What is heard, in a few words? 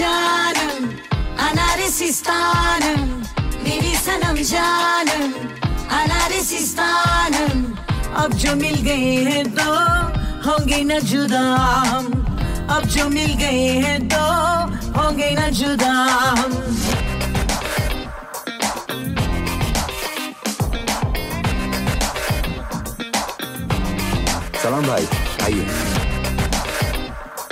hindi ringtone, bollywood ringtones